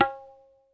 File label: Tabla N, Yamaha DTXpress; drum machine; Percussion